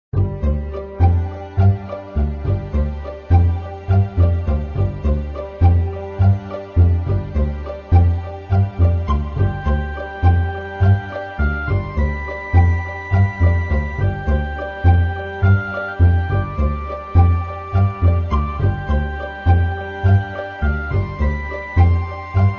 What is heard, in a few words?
menu Music; Feeding Frenzy